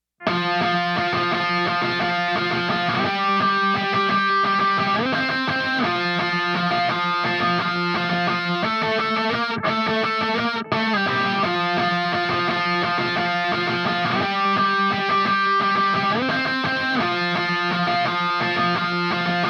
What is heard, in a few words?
guitar Off